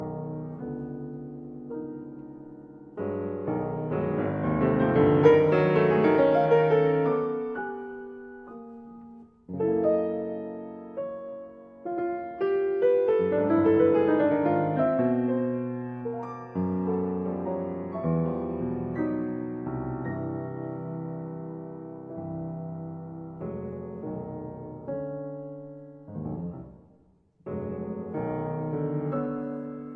Salon Music

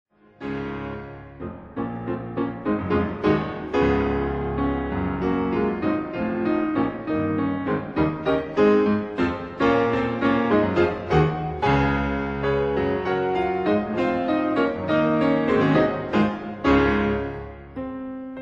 Fantasy Pieces for piano; Op. 12; Grillen

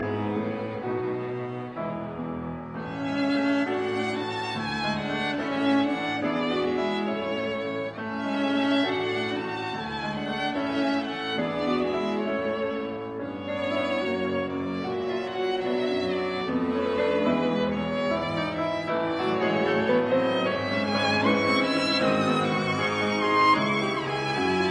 Piano Trio in A major Moderato; Op posth; Mirecourt Trio

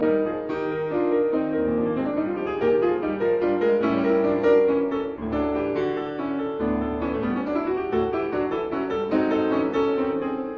Menuet in G minor; Rameau

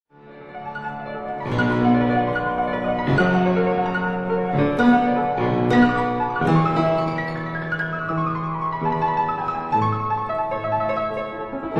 Etudes for piano, D major

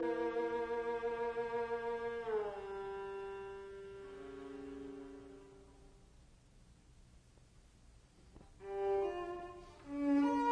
String Quartet Preludio Moderato assai; Martinon; Op 54

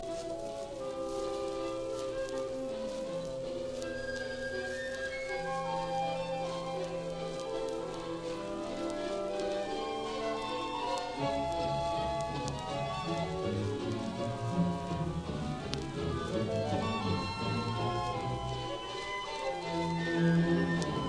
Gay, Symphony Orchestra; Hindemith; Symphonia serena; Philharmonic Symphony Orchestra

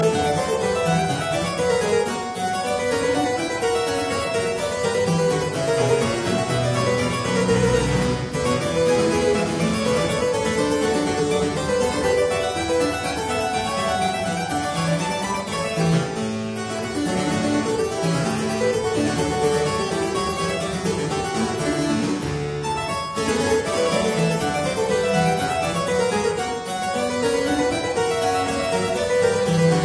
Suite in E flat major, Bourree

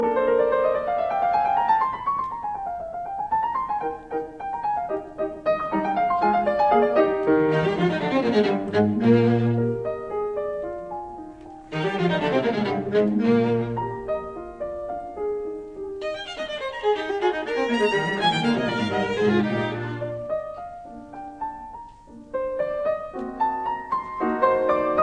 Piano Quartet in E flat major, Allegretto; Mozart; K 493

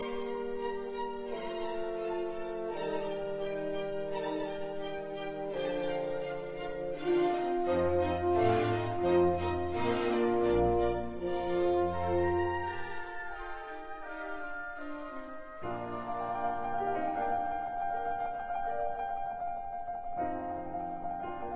Concerto for 2 Pianos in E flat major Andante

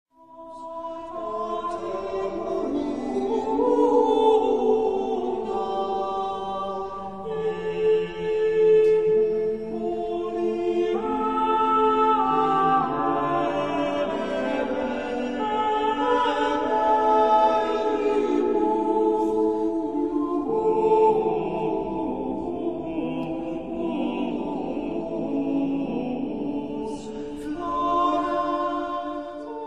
respond & antiphon for 3 voices; Dunstable; Sancta Maria, non est tibi similis

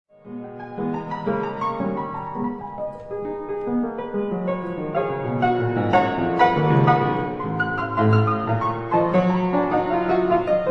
Etudes for piano G sharp minor

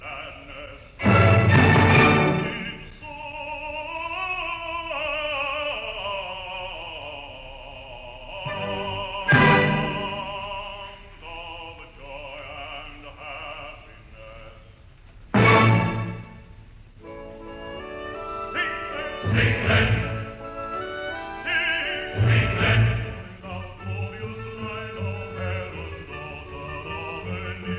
Symphony in D minor Choral Finale Presto; Symphony No 9; Op 125; Arturo Toscanini

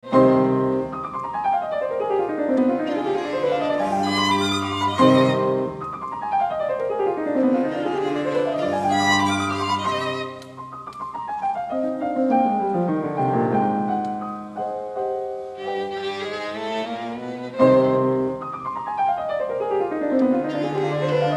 Piano Quartet in E-flat Allegretto; Mozart

